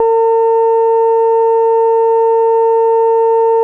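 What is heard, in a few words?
Yamaha CS80 A# 6; ESOTERICANLG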